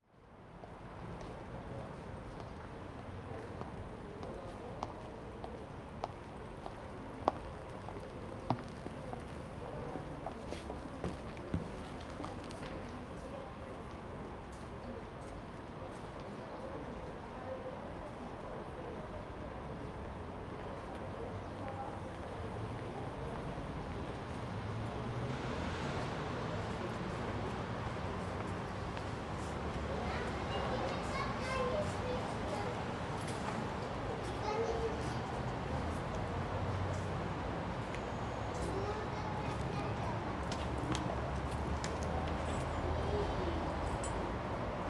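ambience austria vienna interior day subway station; sonniss gdc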